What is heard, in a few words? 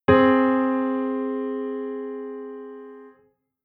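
Chord Interval Minor 2nd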